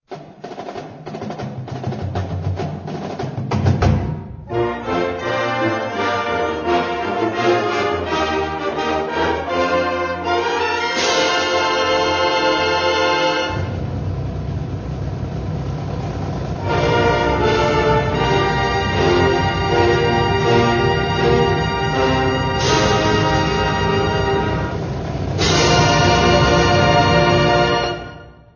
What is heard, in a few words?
Fanfare; Eastern Kentucky; Hail, Hail Eastern Maroons